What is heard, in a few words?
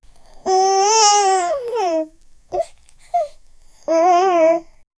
babys soft cooing; adorable